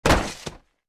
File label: Body slam against surface